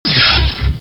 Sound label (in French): Désintégration